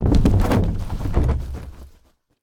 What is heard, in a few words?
Footsteps Go Down Stairs; LOOPERS